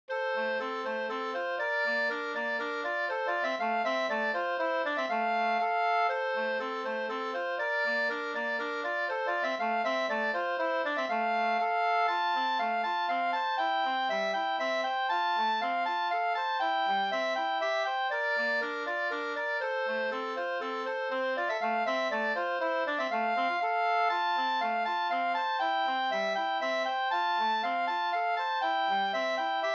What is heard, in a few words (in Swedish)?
slängpolska